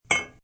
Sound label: Mtl Spn Drp, Metal spoon drops and clanks.; Objects